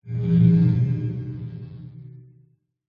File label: science fiction mysterious swell